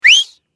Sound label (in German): vox pfiff